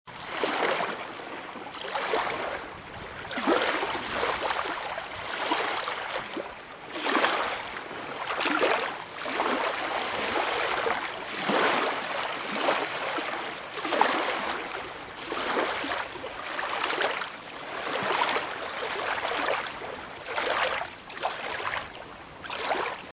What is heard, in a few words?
light lake waves with wind; UltimateFishing2020